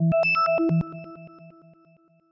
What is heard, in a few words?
Kind Alarm